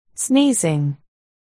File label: sneezing